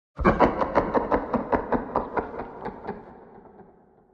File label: creepy laughing